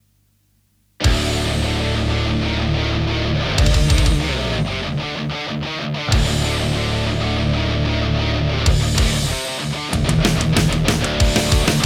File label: Metal, pickups; NORD